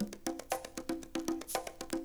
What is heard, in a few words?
bongos galore, Drum loops